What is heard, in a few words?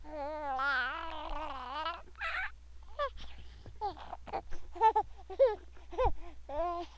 giggle